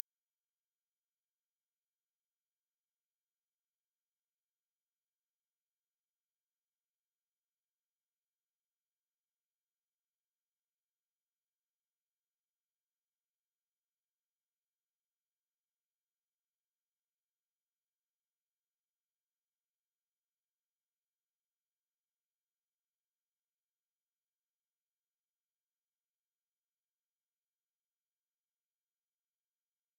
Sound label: mosquito repellent 17khz